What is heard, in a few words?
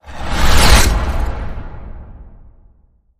Puck Stop Whoosh